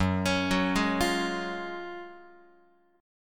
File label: Gb Major 7th Suspended 2nd, Guitar